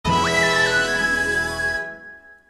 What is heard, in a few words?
notification, ringtones; Disney